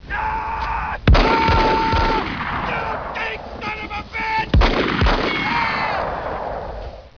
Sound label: aahaah; Gump